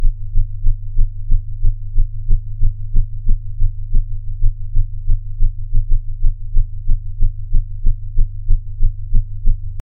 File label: smooth idle sound for a, steady